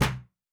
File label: Metal Box Impact